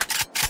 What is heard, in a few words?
ak47 equip; vandal; rif ak47; prime vandal